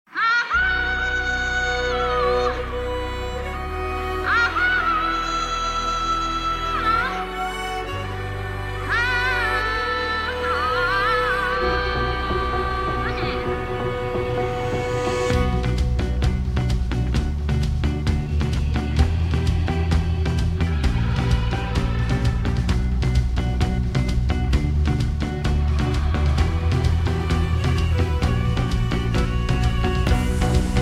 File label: Karaoke Version